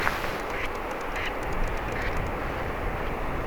kurpan lentoonlahtoaantely

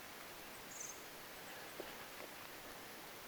keltanokkarastaslintu voisi olla sinihohtorastas koska paikalla myos sellainen oli

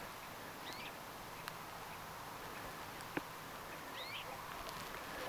huomioaanteleva viherpeippolintu

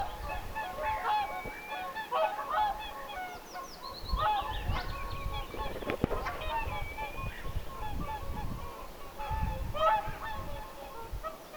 voisi olla ilmeinen amrikankurmitsa; onko amerikankurmitsa?; Pitkällä rannalla; Pitkällä rannalla oli ilmeisesti jossain amerikankurmitsa

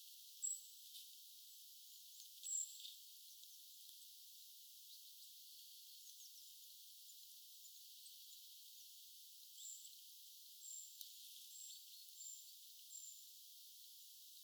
tuollainen puukiipijalintu siella luontopolun ruovikkolahden viereisen ruokinnan lahella

